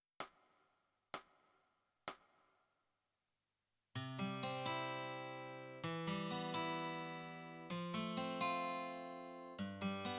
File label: Guitar